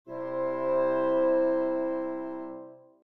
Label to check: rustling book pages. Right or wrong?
Wrong